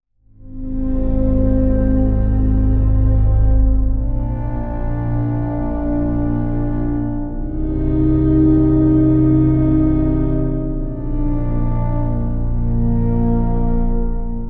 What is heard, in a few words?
Pad; Routed Sound; Sylenth1 Expansion - Flame Summoner Vol.1